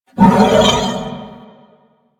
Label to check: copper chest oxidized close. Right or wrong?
Wrong